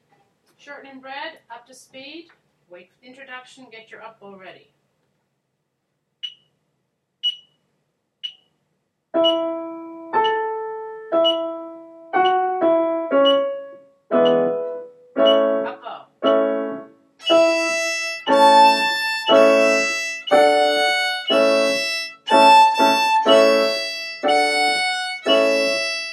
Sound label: Shortenin' Bread faster; Strings; Practice Recordings; Beginner